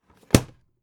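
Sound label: aluminium case small close short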